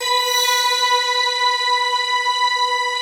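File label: Chinese orchestra, Sweep; Optical Media International; Sonic Images Library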